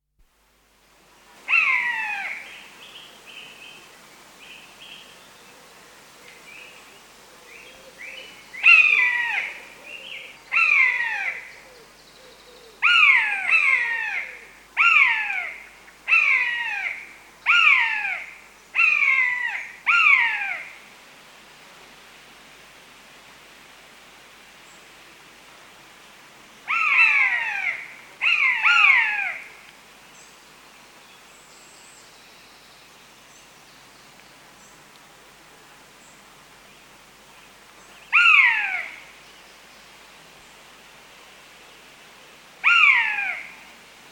Buzzard, two adults calling, song thrush, wood pigeon, wren and jackdaw in background; Bird Sound Effects; BBC